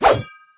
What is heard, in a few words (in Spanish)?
ataque doble; luchadores